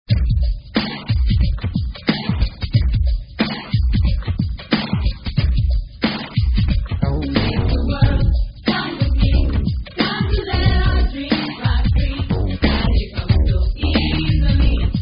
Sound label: Rap Instrumental; Girl Scouts